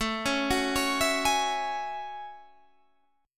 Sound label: Gb Minor 9th